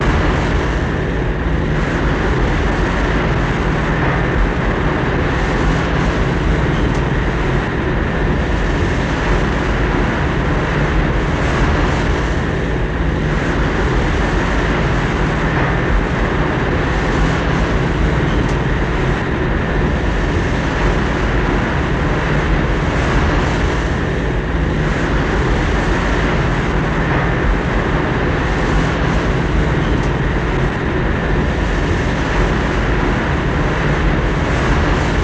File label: Ship Constant Loop; ships